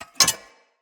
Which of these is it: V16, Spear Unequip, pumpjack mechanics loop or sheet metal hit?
Spear Unequip